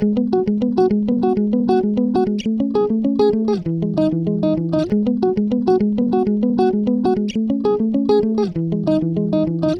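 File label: guitar clean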